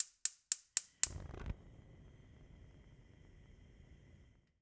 Stove Gas Clicks And Lighting, Household

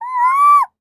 Animal Primate Monkey Ringtail Monkey Call; animals; AdobeCC

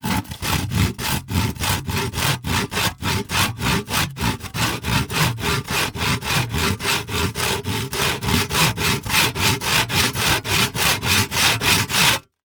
Industry Tool Saw Hack Saw Sawing Into Wood

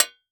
Impact Shovel Hit By Solid Metal Pipe

